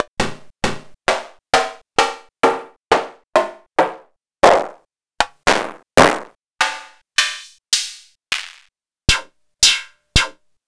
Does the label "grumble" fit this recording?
No